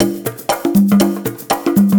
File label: 120 PERC; Best Service Advanced Media Trax Modern Composer